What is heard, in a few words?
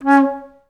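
staccato C#3; Best Service; Peter Siedlaczek's Advanced Orchestra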